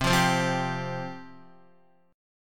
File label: C Suspended 4th C, Guitar; Csus4 strummed; x,3,5,5,5,3; JGuitar